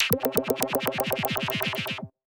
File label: C3, SynthLine; 90 s Sample CDs; NiceBeats; SynthLine VOL-14